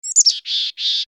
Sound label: chickadee single chirp; sound ideas